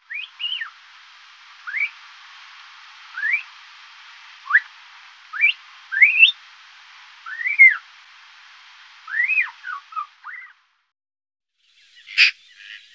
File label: black billed magpie